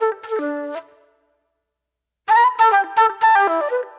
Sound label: Soul Plate Flute; Sonic Foundry (Sony Creative Software); Manhattan Flutes; Session 03 - Classic Caddy